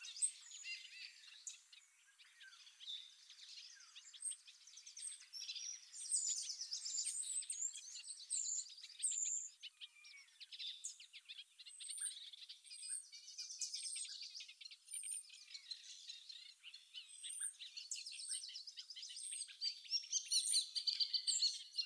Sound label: Birds Loop; Survival Game Sounds - Lite Edition; Environment